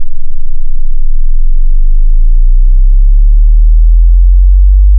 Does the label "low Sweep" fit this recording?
Yes